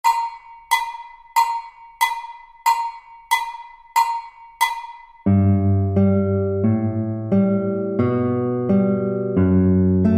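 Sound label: Etude accompaniment, guitar; B string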